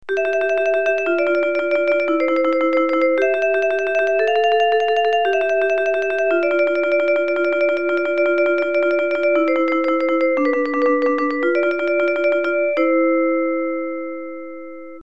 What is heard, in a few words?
marimba; tahorn midi keyboard